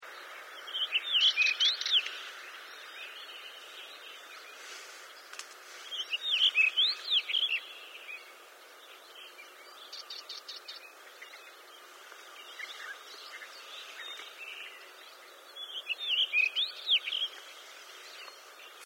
Blåtrast

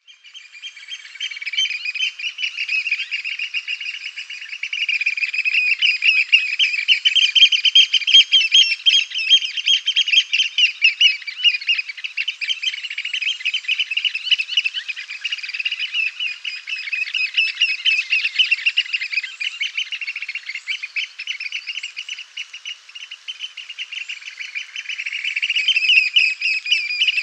Strandskata

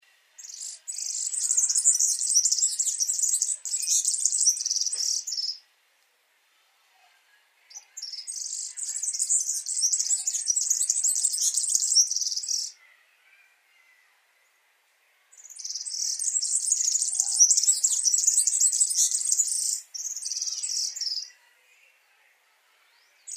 Gulhampling

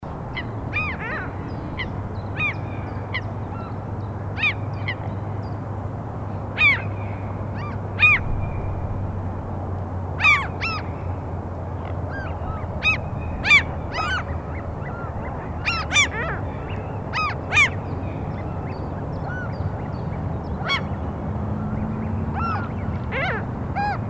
Svarthuvad mas

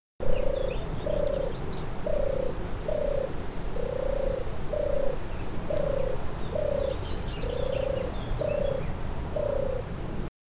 Turturduva

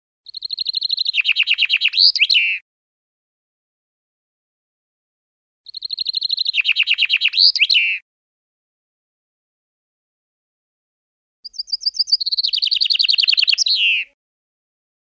Bofink

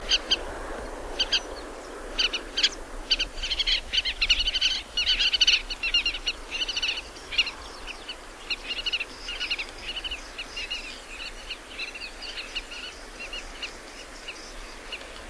rodvingad vadarsvala

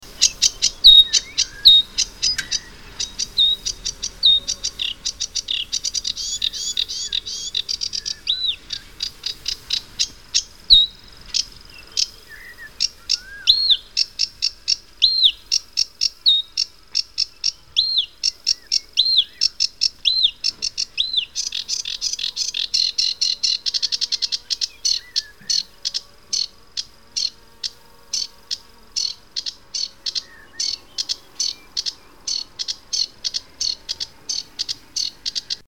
Savsangare

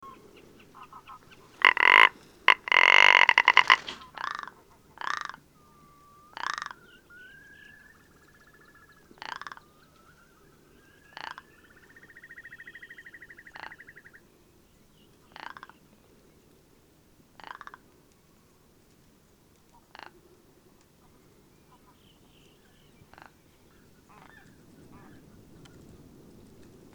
Fjallripa

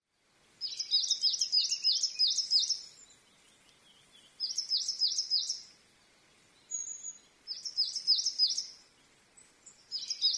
Svartmes

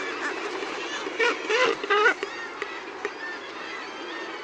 Toppskarv

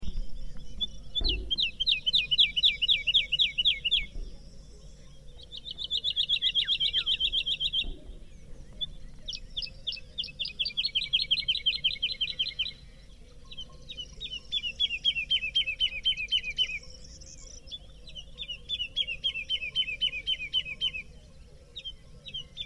Tradlarka

